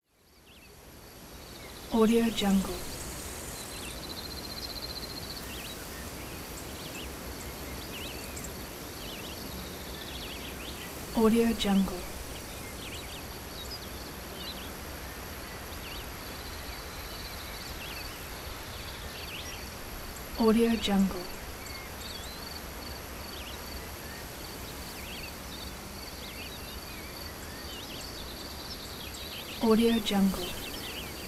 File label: field ambience